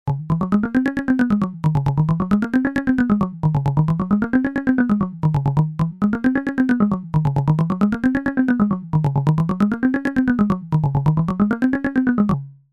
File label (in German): ein Blacet VCO hartes Attack